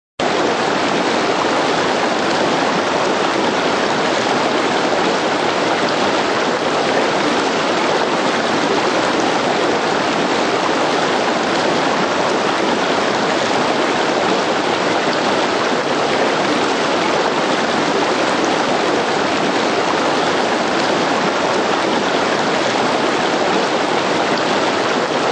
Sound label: Constant rushing sound of a mountain river.; Mountain River Low Pitch; Rivers